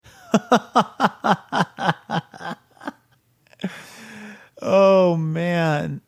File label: laughing oh man